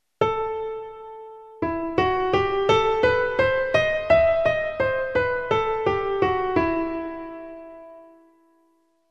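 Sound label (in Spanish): escala dictado rítmico; vitoria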